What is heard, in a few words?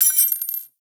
Belt Fed Rifle Links G; Warframe; Tenno; Frumentarius